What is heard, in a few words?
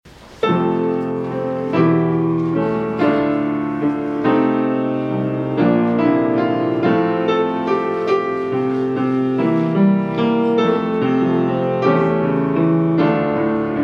Hymn